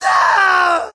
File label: scream, Screams; Civ, Voices